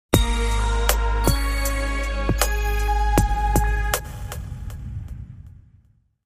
Hip Hop Logo

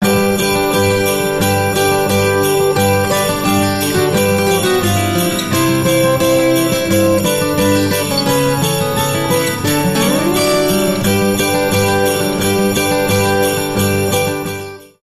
Jingle Bells

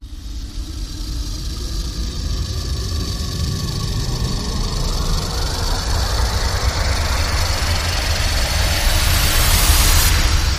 Basilisk Rattle Rise

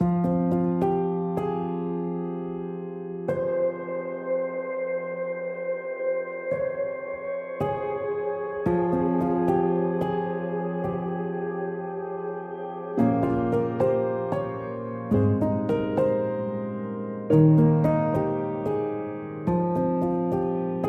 Reduced Synth

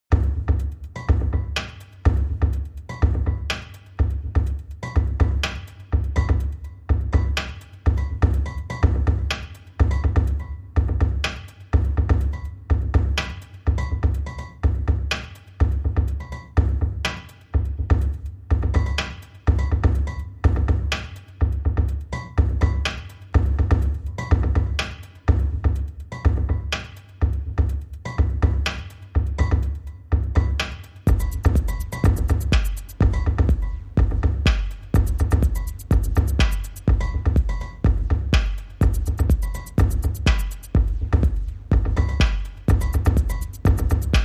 Drums Only

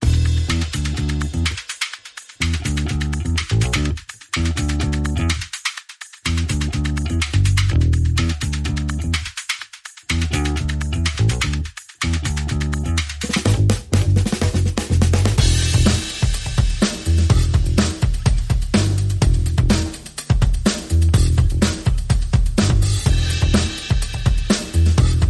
Bass and Perc Only

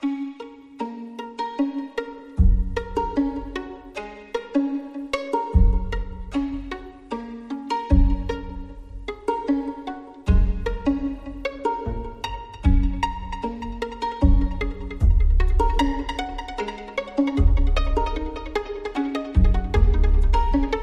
Stringing, Underscore

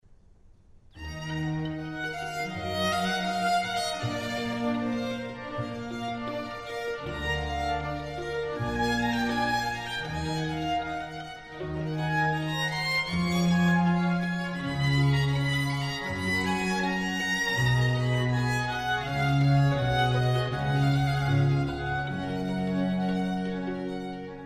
Concerto in A major for violin organ & strings; Il Rosignuolo; Antonio Vivaldi (PD)

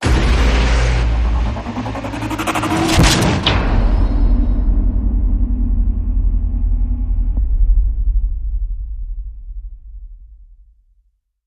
Hit; Sttr Engage the Threat

